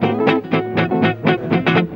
EGUIT GROOVE; Best Service; World Colours

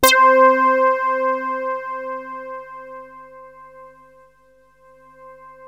BASS FORM; MORPHEUS; Syntec; Wall of Sounds

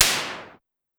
Noise Hit Hi, Pitched Up; Clavia DDrum-AT

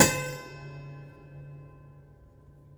Prepared Piano; Battery 3 Sample Kits

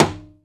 MUTED RIM, Timbale; Timbale Battery

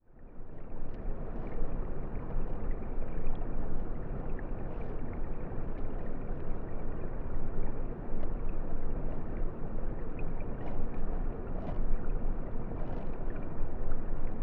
Hydrophone, Underwater, River; underground sounds

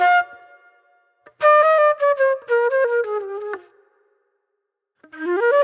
Wild Flute

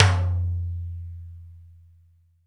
timbales

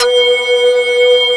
TABLURA

